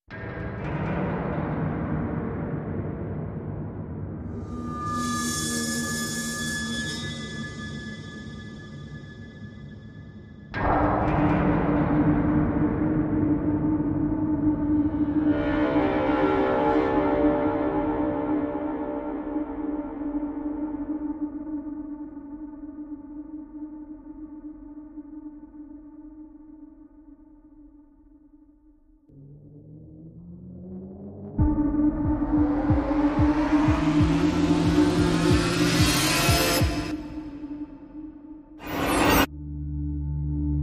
No Perc

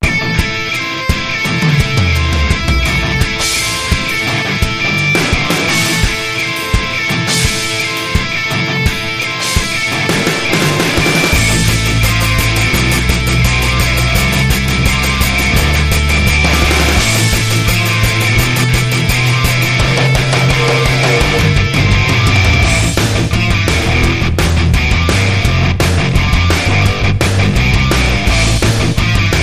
Riff

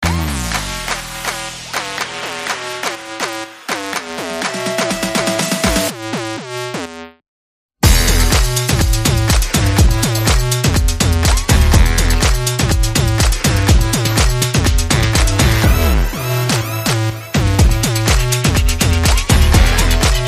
No Vocals

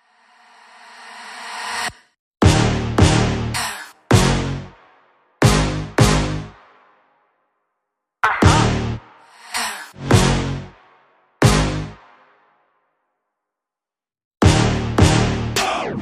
No Drums No Bass

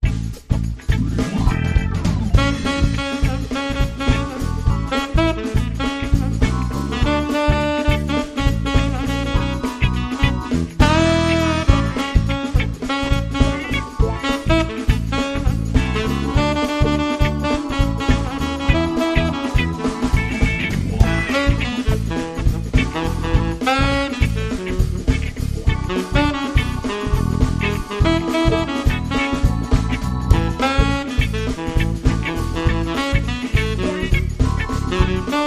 Jingle Bells; Trad (PD)

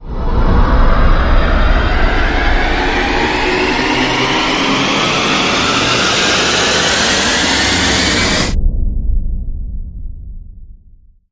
Rise Short Teleport

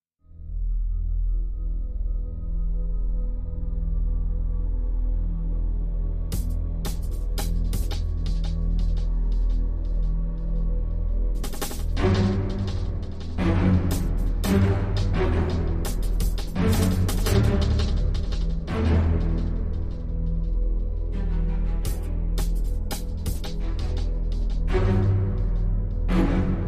Energy Tension Mix

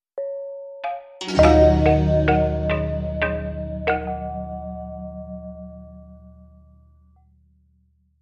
Short Marimba

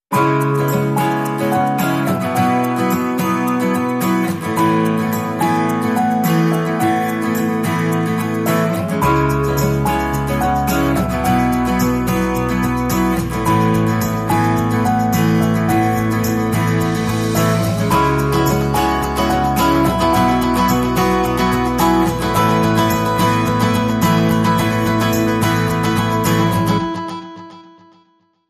Positive Motion

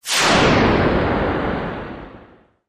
Hard Noise Sweep

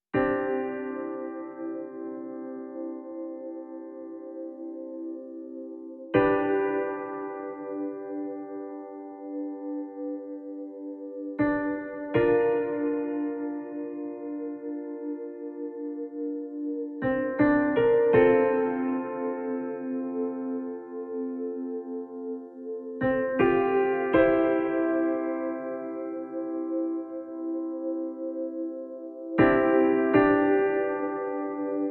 No Guitar

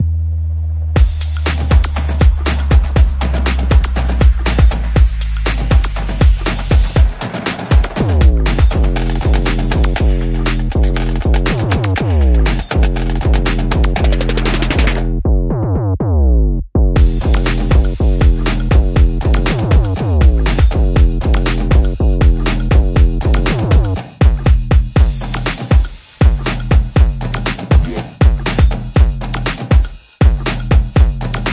Drums and Bass Only

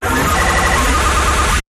Rapid Fire Noise Zap

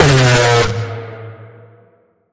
Bend The Alarm, B

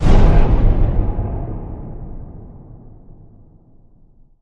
Hit Low Fail Safe

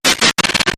Short Gritty Stutter

